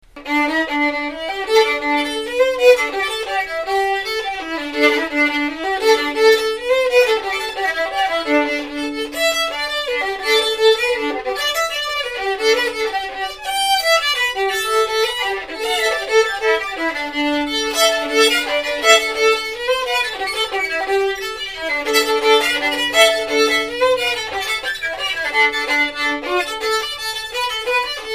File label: jig, violin; adult violin